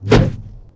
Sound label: huge swing